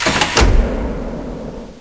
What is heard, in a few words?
trigger; quake2; mnhcoop